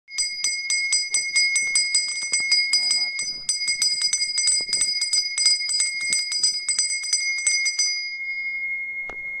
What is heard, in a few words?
ice cream bell sound